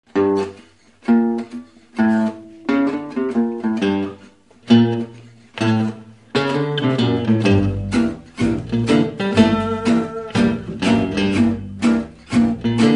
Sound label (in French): blues mineur, playback